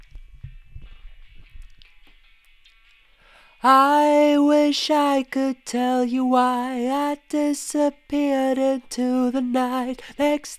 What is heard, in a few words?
vox